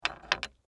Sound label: Tool Set Down, Industry, Tool, Set, Down, On, Wood; Hand Tools (Sound Effects:Tools); BlastWave